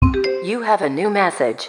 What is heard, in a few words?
notification; MIUI12